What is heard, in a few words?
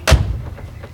TRUCK DOOR; Transportation; Doors Hoods Brakes